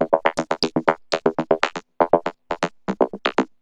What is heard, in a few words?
Vocobeat; Sonic Foundry (Sony Creative Software)